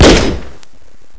scope

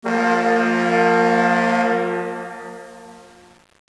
ships horn; de port island

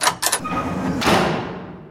default door

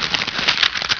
tree

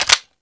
mp44 reload clip in, weapons; svencoop; ww2projekt

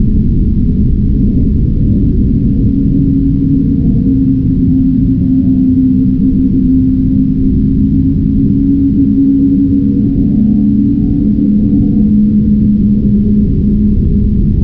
cave wind

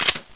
p90 clip release; svencoop

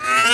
whizz

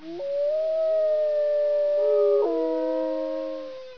wolves

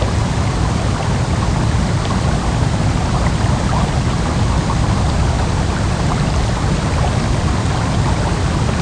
water cooler; de BadDay

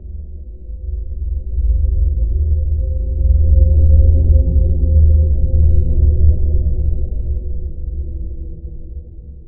twitch loop; 2017 ag crossfire

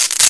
electric; de code

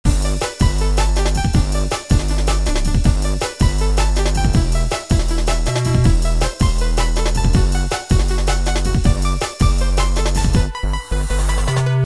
bells beat

